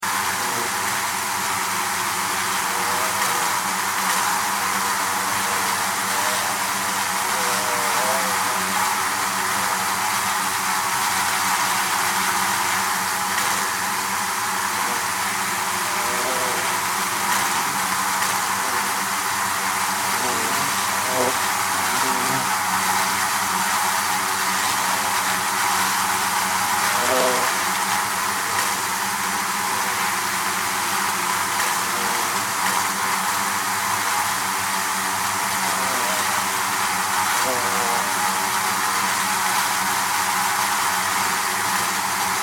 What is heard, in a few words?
Insects Bee Hive, Insects, Bee, Hive, Wings, Buzz; 5.1; Sound Effects:Science Fiction; BlastWave